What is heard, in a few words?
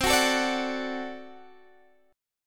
D Major C, guitar